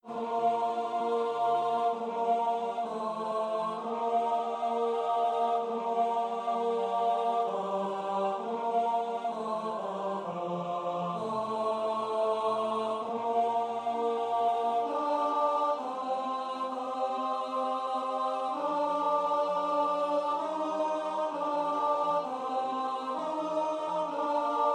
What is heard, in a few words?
Psalm tenor